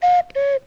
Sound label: Cuckoo, Vintage Cartoon Foley